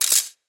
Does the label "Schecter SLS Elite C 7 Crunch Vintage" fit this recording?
No